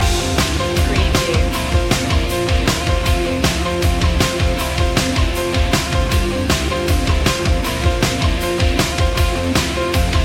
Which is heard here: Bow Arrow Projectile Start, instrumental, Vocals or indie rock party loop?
indie rock party loop